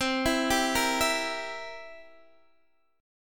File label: C 7th C down Guitar Open D